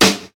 old school hip hop snare sound g key, snare sample tuned to the G note